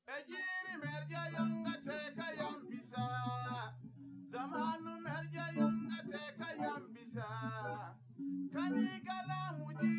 nodrums live rec; SiSEC08; SiSEC underdetermined

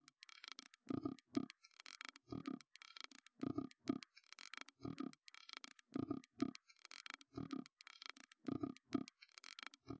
drums; SiSEC10; underdetermined

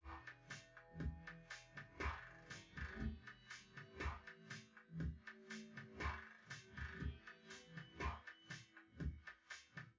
wdrums

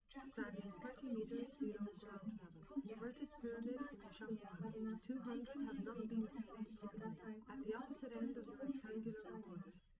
female live rec 1m sim; SASSEC07

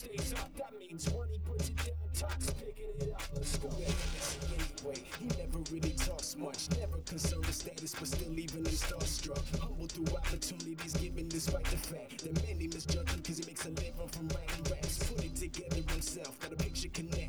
drums; SiSEC11

